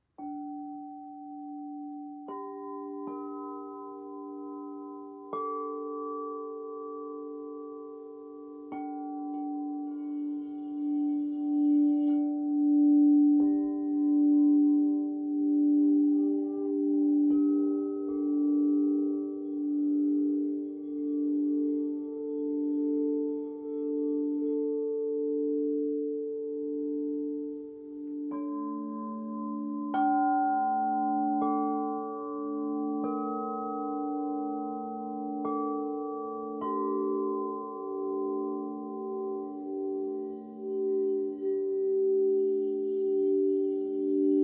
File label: Crystal Bowls Audio